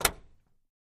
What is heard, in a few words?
Camaro Door Close Lig, chevy camaro